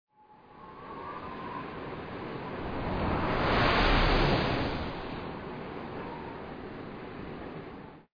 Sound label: Wind; Learn to Fly 2